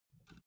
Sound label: filled can pick up soft